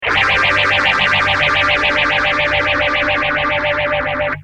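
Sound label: guitar phase II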